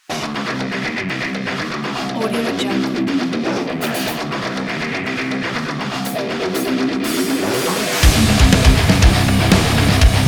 intense groove

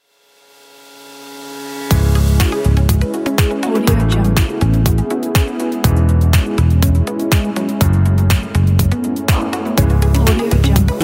modern atmospheric logo

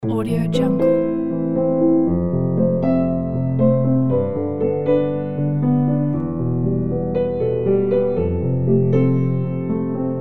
storytelling

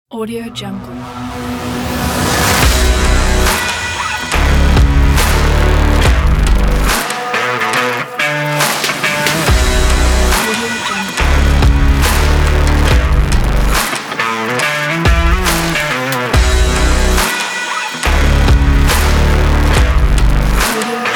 hard dramatic epic rock

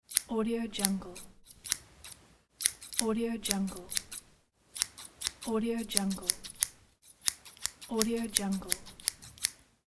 scissors

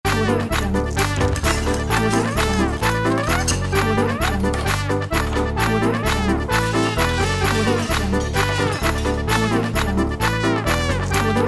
German Munich octoberfest

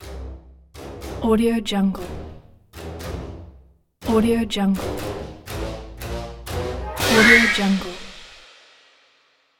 orchestral comic tension logo; marburymedia

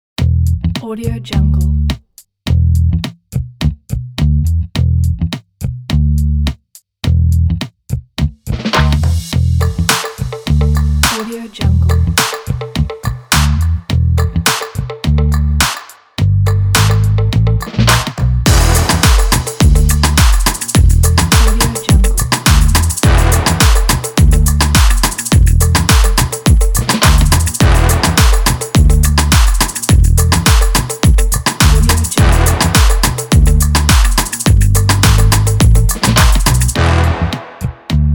funk action advertising; soul prod

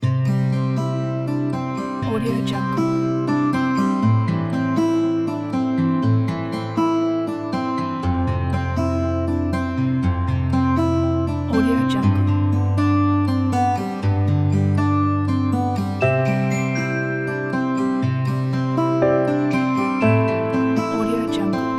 acoustic loop

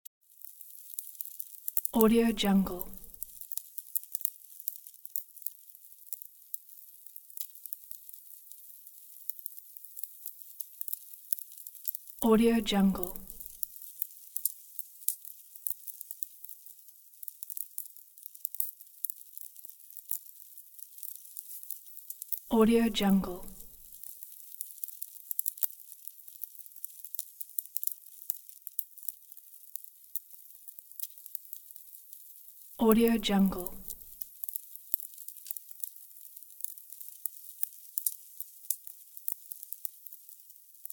candle burning sound

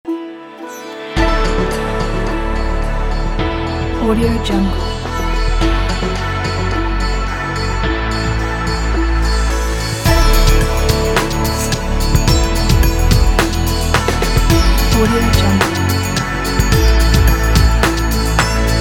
indian travel

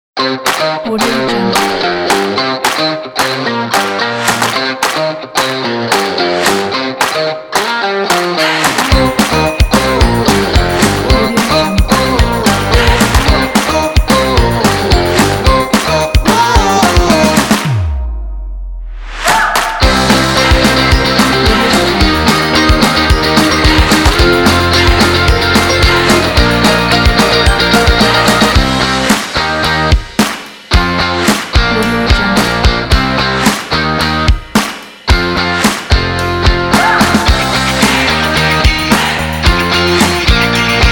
rock pack

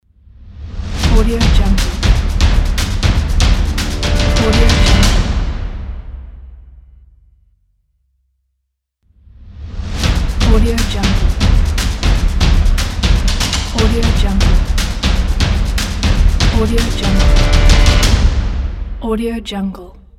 drums and claps logo

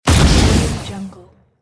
rocket shot

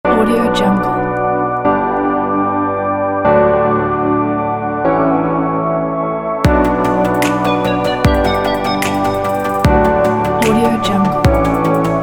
future bass kit

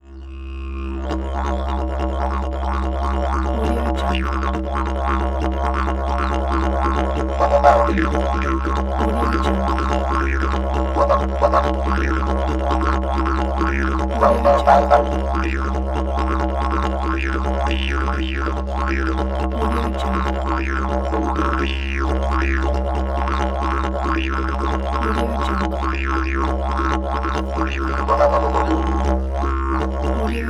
didgeridoo riff fast; tsymbal

